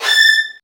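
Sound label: violin, A4, right; Virtuoso Strings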